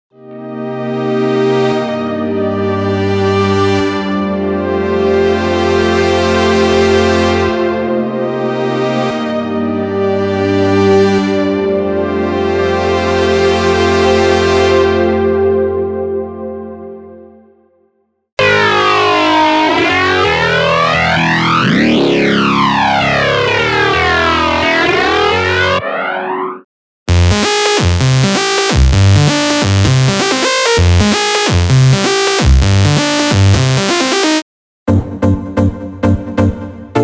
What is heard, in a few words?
Big Room & Progressive House; unisynth